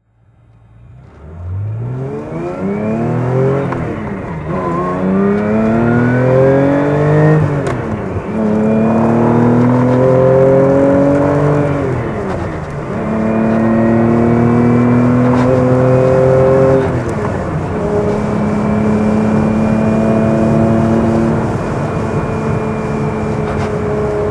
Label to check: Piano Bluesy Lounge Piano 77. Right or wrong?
Wrong